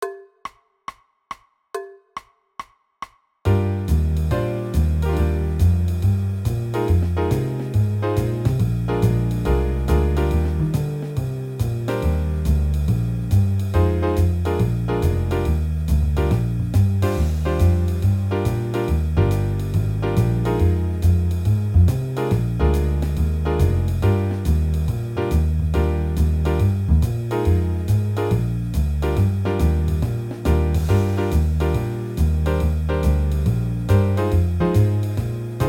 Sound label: warm ups eb major